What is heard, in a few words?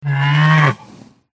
rhino attack voice middle